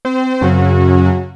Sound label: notok; MeOS version 3.8.1277 RC1